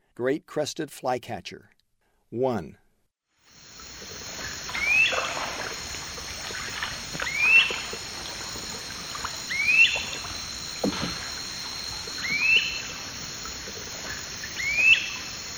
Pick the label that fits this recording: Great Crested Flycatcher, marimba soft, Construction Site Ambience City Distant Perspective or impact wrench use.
Great Crested Flycatcher